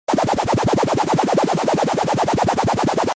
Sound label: move fluttered noisy fast